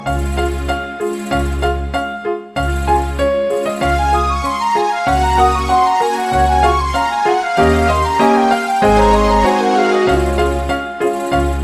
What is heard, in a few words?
jingle bells ringtone